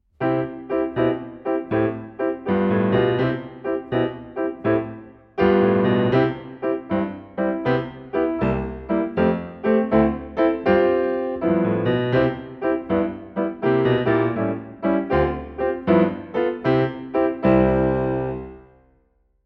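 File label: oliver twist live piano accompaniment; In Harmony teacher resources; EYFS and Key Stage 1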